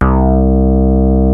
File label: Moog synth; OLD SYNTHS; Rubber Chicken Software Sample Bank